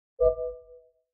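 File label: mac os operating system sounds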